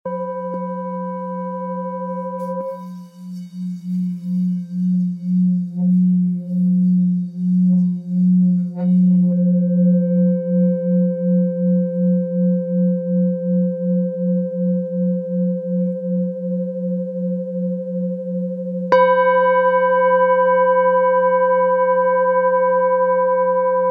Bol ancien fa diese

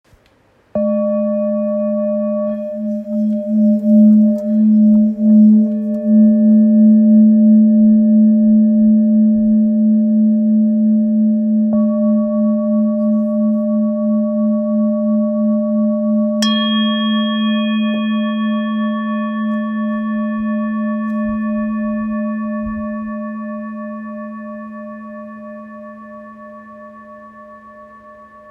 Bol ancien re diese

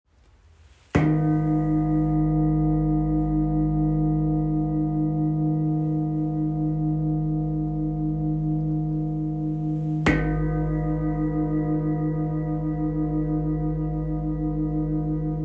Gros bol Re diese

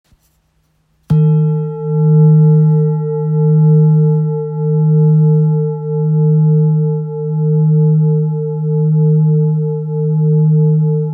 Bol Thick re diese

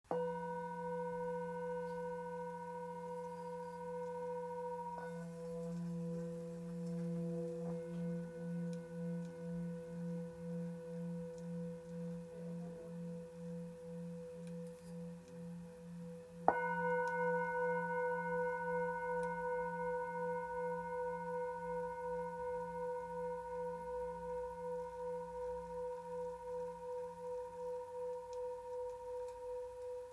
Bol therapeute mi

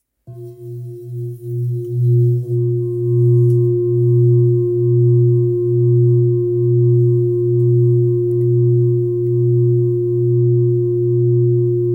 Bol bengale Fa

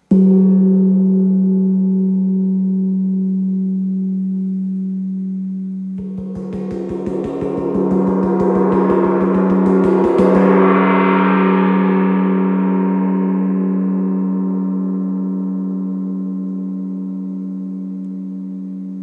Chau gong 45cm fa diese

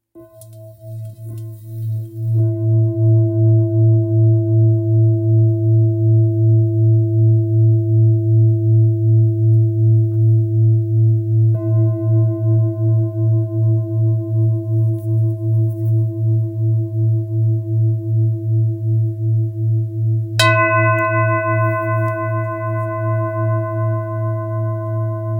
Bol Bengale 2.1-2.2kg la

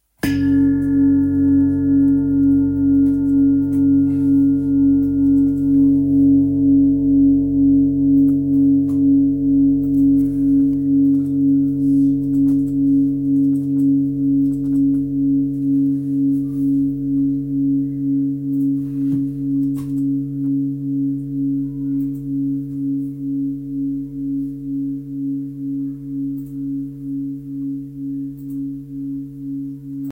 Gros bol grave do dièse 9.28kg pieds-chakras; Bol chantant gros volume 4kg et +

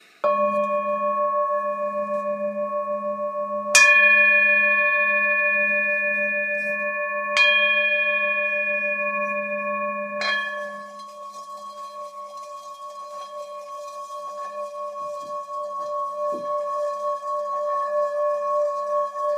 thick bol 1,540kg note SOL

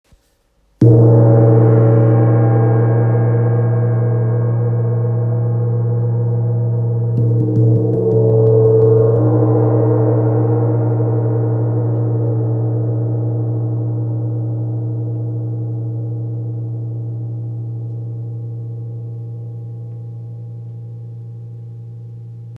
Wind gong la dièse 55cm

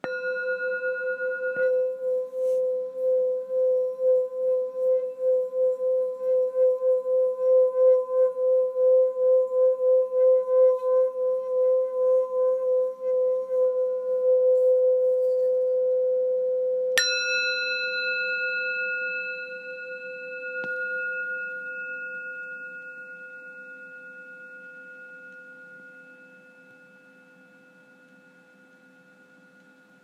bol bengale 2-300g Fa

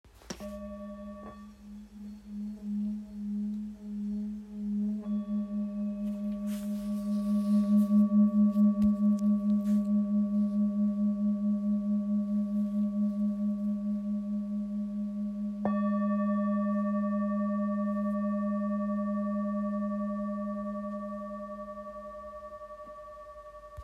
Bol therapeute Sol diese

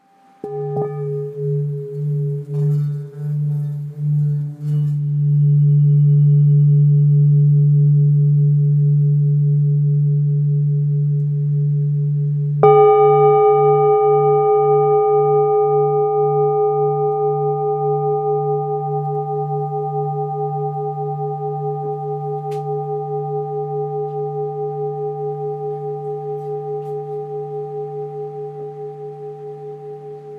Bol bengale ré

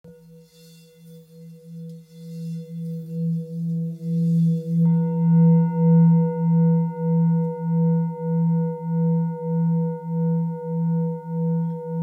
Bol full moon mi

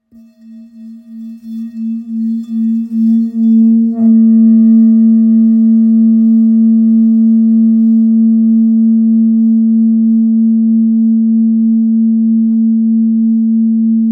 Bol ancien la

